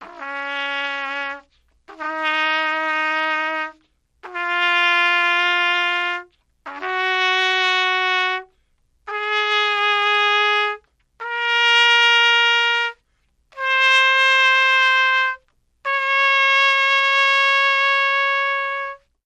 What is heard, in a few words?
Trumpet Bad Practice Scale No Reverb